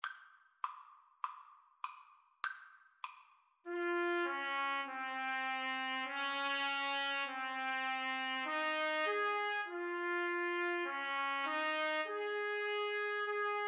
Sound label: trumpet duet